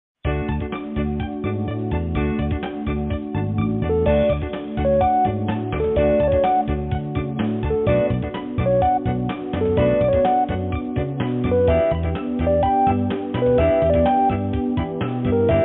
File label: gimn megafona